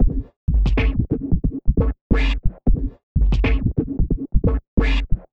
underwater club 090bpm; Zero-G; Downbeat Dance Grooves

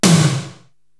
Tom Processed

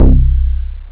Super Hyper Bass; jazz jackrabbit 1; M8

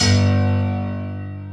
TRINITY PNO; Pro-Rec - Synthsonic

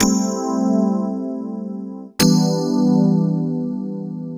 EP, Keyboards, Electric Pianos

